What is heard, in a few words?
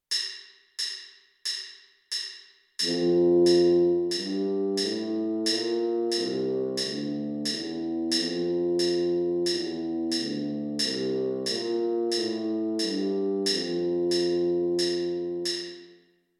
f major scale tuba